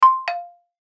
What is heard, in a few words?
chime disconnect; Multimedia; Chimes; Blastwave